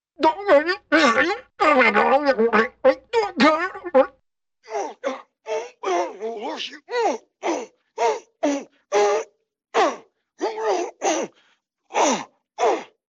beard guys grunt

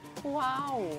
uau

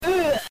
cry

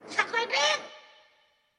bird

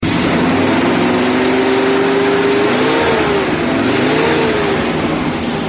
car engine revving; soundbible

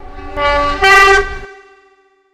class 37 horn

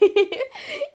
hihihi

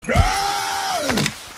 pirate scream

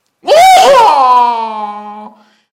uoohooo

